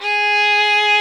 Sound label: STR XVLN, Str Quartet; Viola&Violn